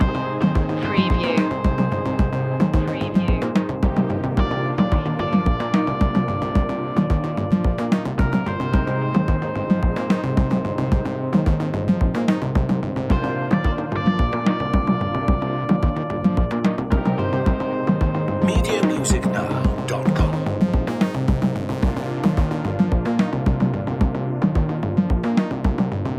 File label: satellites loop